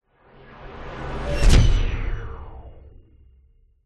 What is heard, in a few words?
sound design whoosh sci fi pass hit; zapsplat